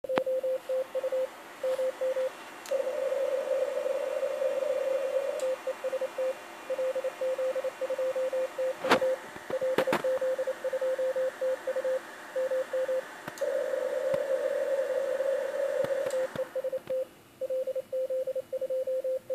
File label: CW DEKODER TX